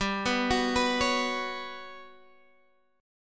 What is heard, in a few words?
G Major 7th Flat 5th, Guitar Half Step Down